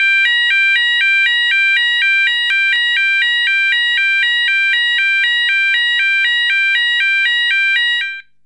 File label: Sounder; High/Low Two Tone Siren - 970Hz/800Hz; Weather Proof Sonos Electronic Sounder SS240W